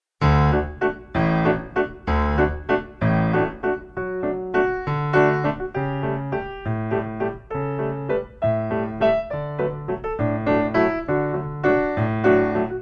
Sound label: big waltz